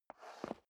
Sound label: step leather shoes concrete scuff